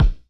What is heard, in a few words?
Floor Rumpled, Kicks; Airwindows